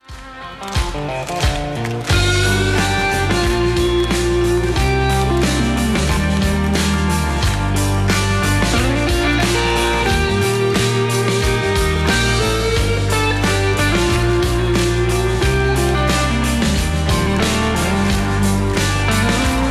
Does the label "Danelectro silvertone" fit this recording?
Yes